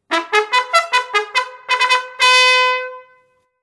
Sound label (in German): Ruf 3. Eskadron, Kavallerie